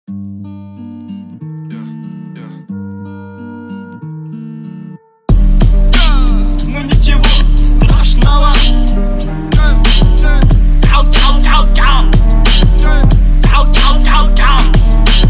36-38Hz Low Bass

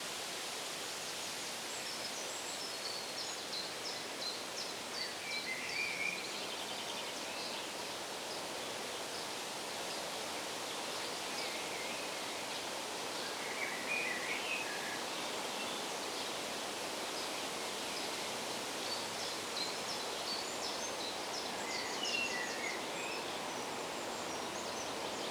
Forest Sounds

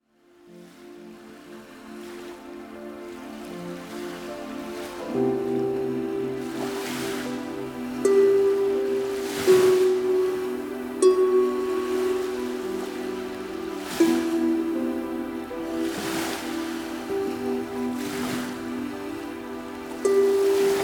Harp Ocean Waves

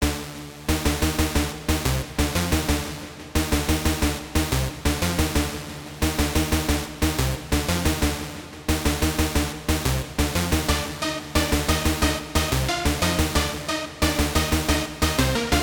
Beat; Style Cyberpank 2077

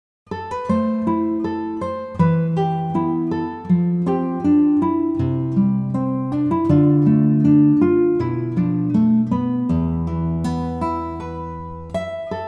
Minor Guitar

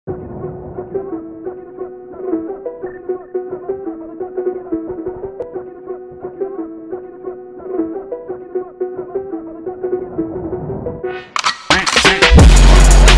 TRUCK DRIFT PHONK

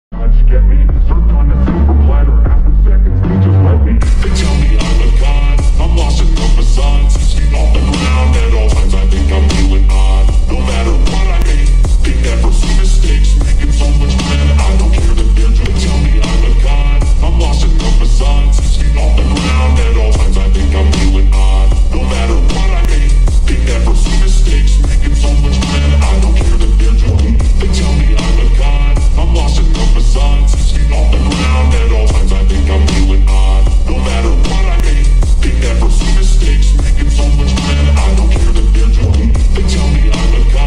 Bass Boost

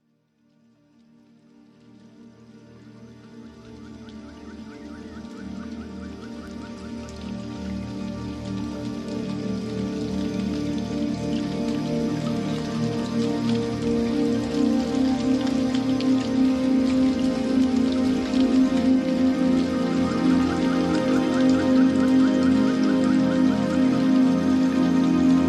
Zen Music Garden Rain; Natureza

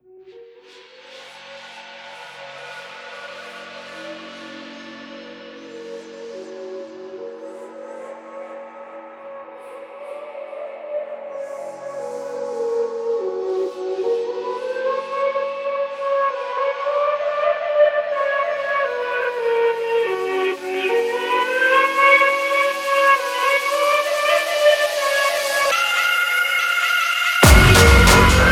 Mystical Flute

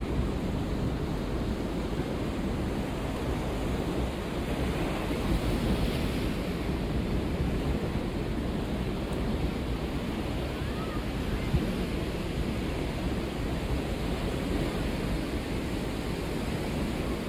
Cold Evening Ocean Ambience

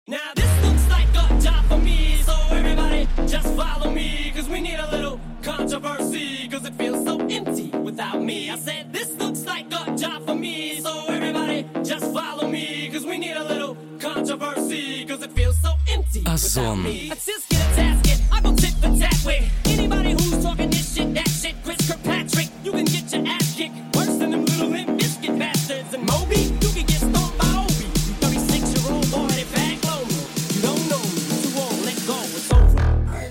Bass

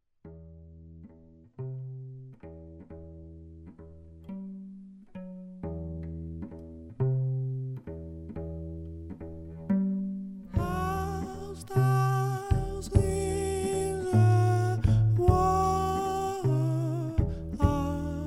lirical jazz

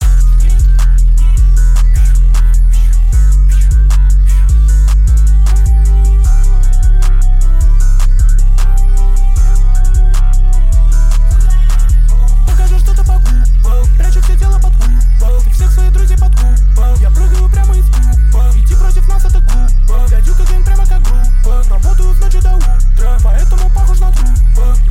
bass up

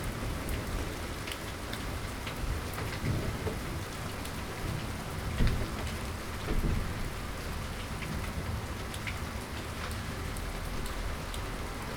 Sounds of Nature White Noise

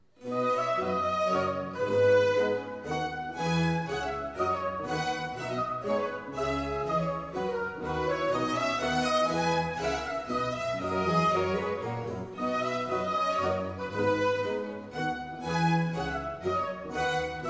Menuet

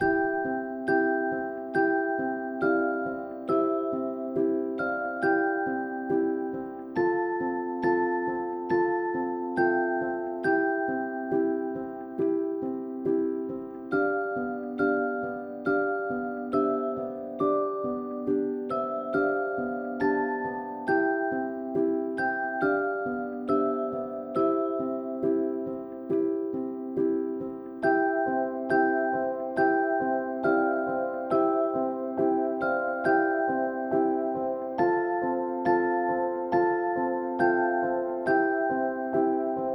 Sleeping Baby Music

